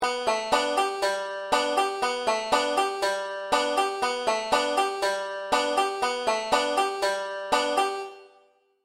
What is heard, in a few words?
pull off b 2 a, banjo; 5 string